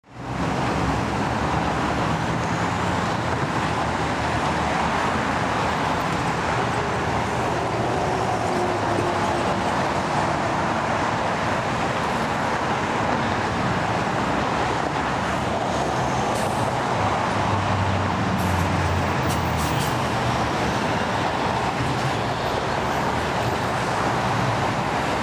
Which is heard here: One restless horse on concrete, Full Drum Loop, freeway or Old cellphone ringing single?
freeway